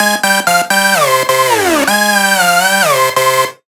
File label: synth 128 Cm; Anthemic; synth loops